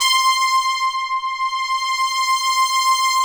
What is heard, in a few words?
brass, synthesizers; SD1 STAR